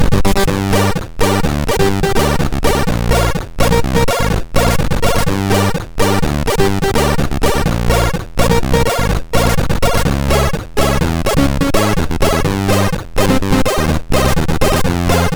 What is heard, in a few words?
Goat march sid MOS6581R4